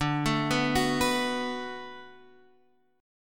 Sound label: D 6th D down Guitar Open F, chord; D6 arpeggiated